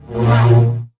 Scifi Forcefield Disable; Energy & Forcefields

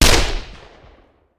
Machine Gun; Sound Ideas; Guns

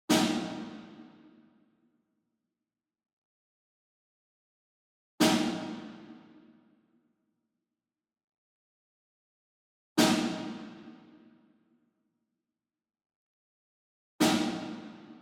SINGLE SNARE Brady Jarrah Block Hit Far; boom library; Cinematic Strikes Construction Kit

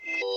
mic On; Apotheorasis

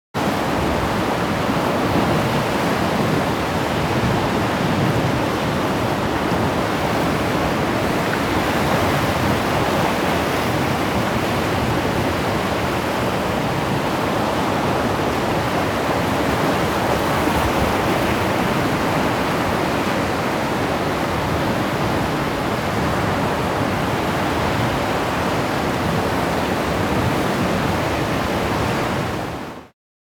sea waves wild; best service Blue Box; sea ocean

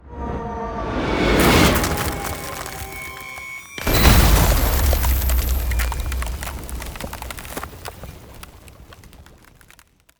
Ice Spell Damage Direct Arctic Sting; Arctic Aura

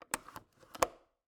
Cabin, window, latch, flip, lock, open, wide; Eiravaein Works; Vaeyan IV

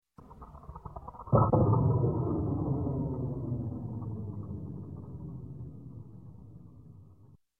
Space Space Explosions, Slow Single Blast; Electronic; Sound Ideas 2000 Series

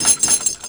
Pickup Key; SFX Kit; Pickups

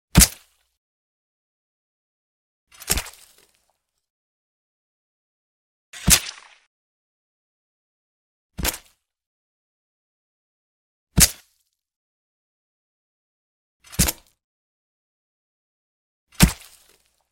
Sword Stab; Hollywood Edge; Martial Arts and Human Impacts

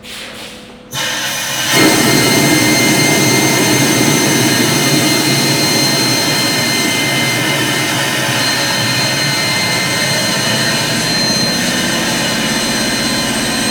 train pressure down; best service Blue Box

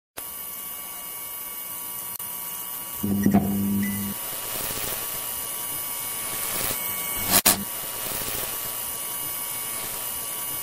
Neon club; Pitch Black - A Detective's Demise

